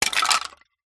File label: foley insulation tile drop break; zapsplat